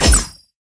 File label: laser